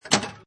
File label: Pinball Sound Clunk; Video Game Sound Effects; Lynne Music